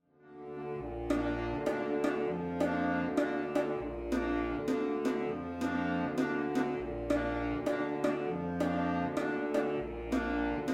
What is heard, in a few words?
Dvorak RHYTHM Training Andante con moto; Clarinet